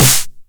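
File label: 909 SD Tape, snare drum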